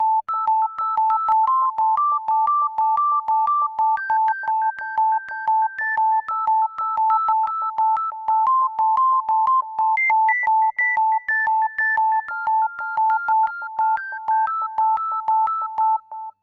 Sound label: Simple Digital Ring, ringtones; Allview Soul X6 Xtreme